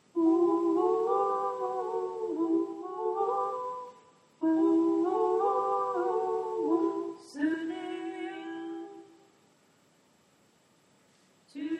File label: tenor